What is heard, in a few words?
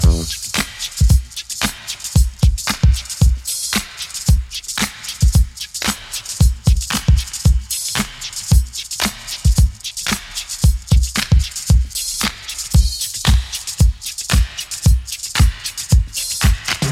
113 bpm modern breakbeat c key